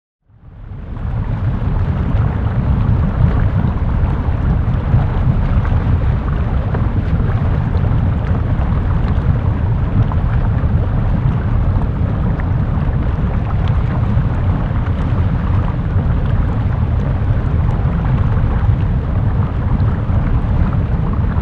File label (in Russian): Lava v vulkane burlit